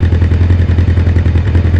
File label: Idle Bike; Valiant Daredevil